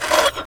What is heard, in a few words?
SCRAPE; Spectrasonic Distorted Reality 2; LO-TEK 2